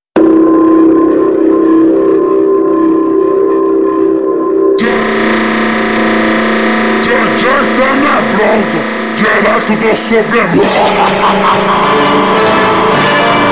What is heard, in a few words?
orquesta sinfonica slowed reverb; Blue Lock